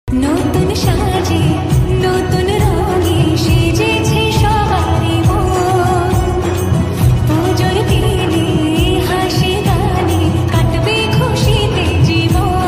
bhakti ringtone; ma durga pujo ringtone; mata rani ringtone

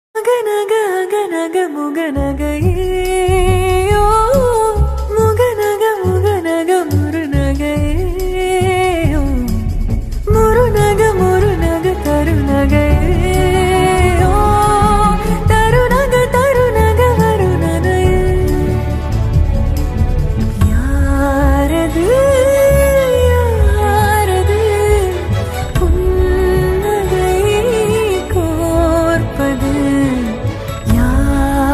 tamil ringtone, bgm ringtone; ps2; ponniyan selven 2; caron tunes

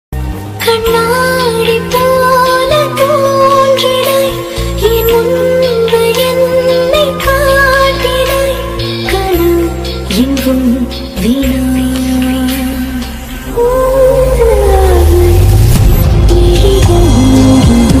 remix ringtone, romantic ringtone

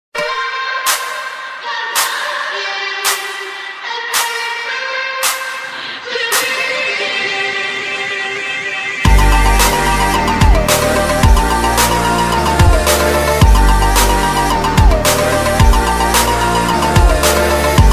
attitude ringtone, Hindi ringtone

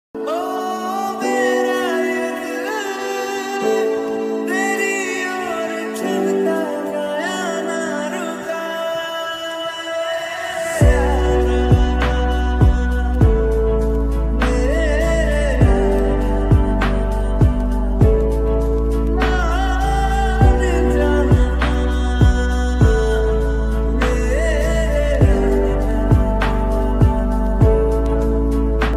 love ringtone

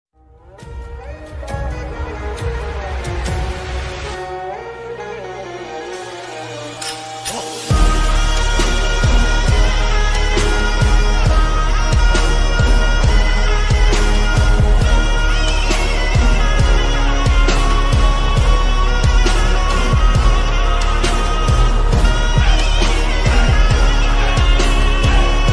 remix arabic ringtone

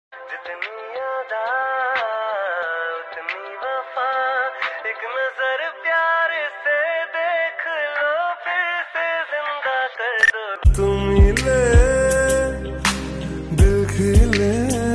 slowed reverb; slowedandreverb

